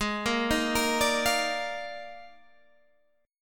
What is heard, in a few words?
Eb Major 7th Suspended 2nd Suspended 4th